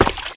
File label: WATERRUN; Sonic R; Windows